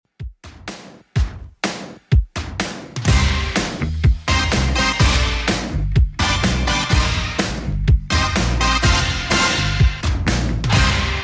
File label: 80s 125bpm; Redrum